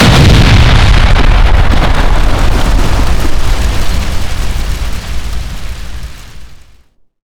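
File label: building big explode; Explosions; Call of Duty Modern Warfare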